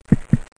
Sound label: Heart Med